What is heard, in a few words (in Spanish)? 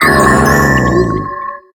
Grito de Bronzong